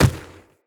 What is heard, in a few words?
Infested Medium Run Dirt, Footsteps